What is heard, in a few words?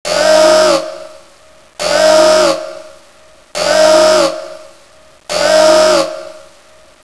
warning; cstrike